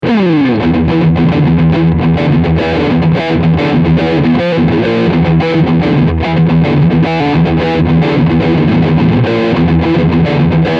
Riff Steg humbucker

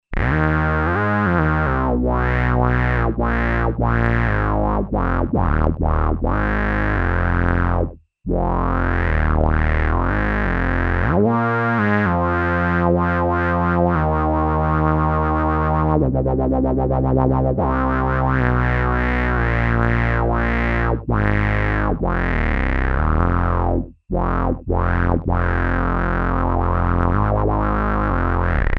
moog theremini bass mit pc minor blues; all your bass

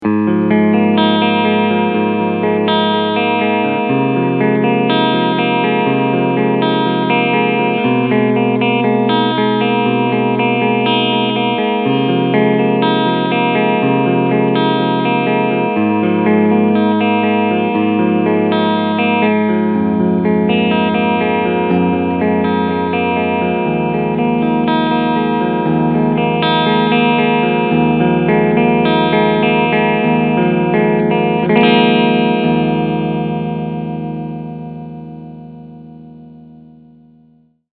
Extreme 76 BK Clean Sound beide Humbucker; Harley Benton

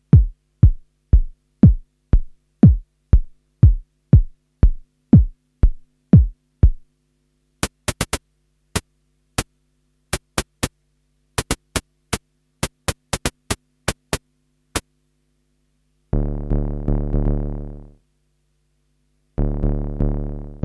Klangspiel

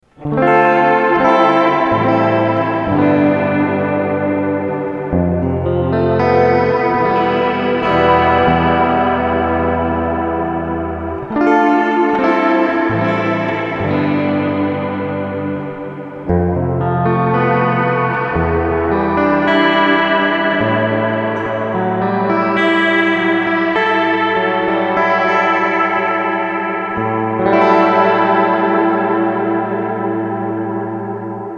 Medium Hall

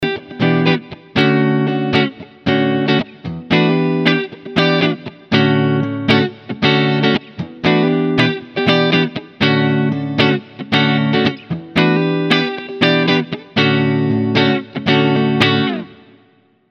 Les Paul 50s Hals Pickup Clean

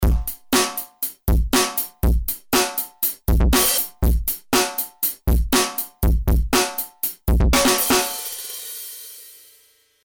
Heftig

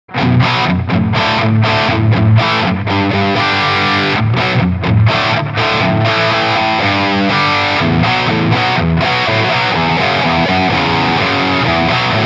Valeton GP 200 Plexi mit Boost

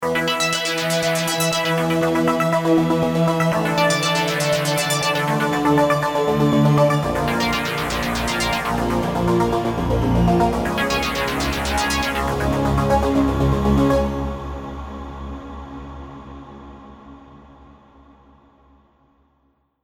Keys Mono Summed Limited trocken; Hybrid Absynth; Chandler; TG2 500

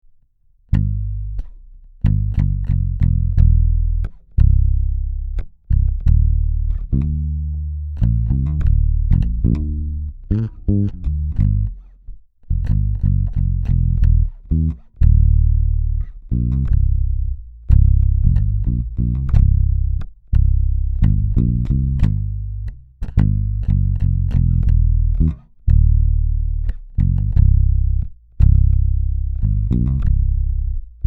Sadowsky NYC5 aktiv beide pickups bassboost VTC zu

